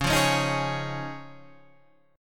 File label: Db Major Add 9th, Guitar Standard; strummed; 9,8,11,8,9,9